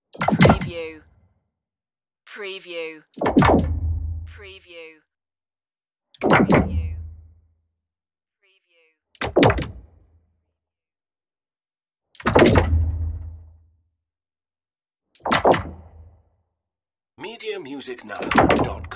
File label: spaceship hatch closing